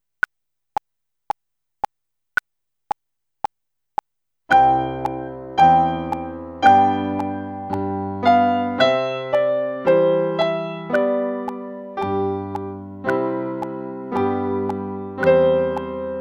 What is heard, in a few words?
Trumpet Voluntary Both